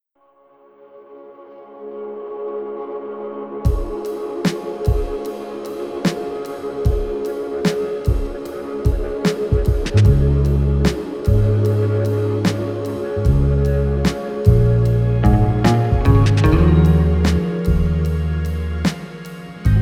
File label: Trip Hop Version